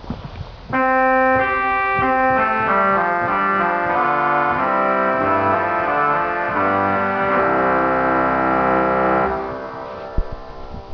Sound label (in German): Trompete 8